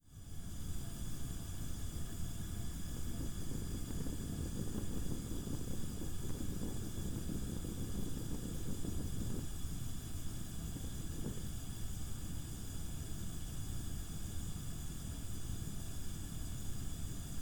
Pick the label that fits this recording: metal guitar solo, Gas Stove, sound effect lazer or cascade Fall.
Gas Stove